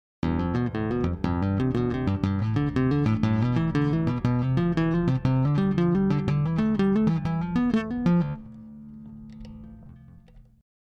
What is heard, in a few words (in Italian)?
Basso Shred